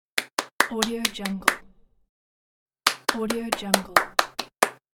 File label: claps